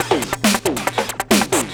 drm 138, Techno, drum-loops; Techno ID; rhythm

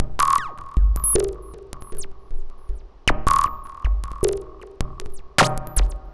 Modular Loop, Modular Percussion

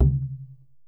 CHANG GO, KOREAN DRUMS

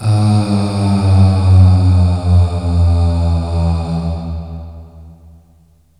THRILL VOX; SCHTOEHN

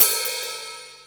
hat, Techno 138 BPM

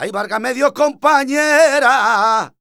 voc c, Flamenco Vocals Male Cantaor

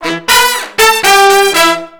BRASS LICKS, Disco Fever

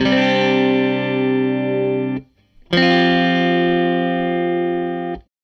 Tele Guitar Loop

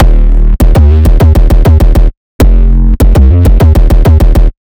Phase Plant, bass

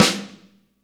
SNR JAZZY, Snares; Roland - Rhythm Section; Snares 4; Sn Modules 4

